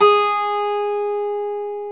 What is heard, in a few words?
GTR 12, Ac 12+ E.12; Club-50 - Foundations Roland; Dual Guitar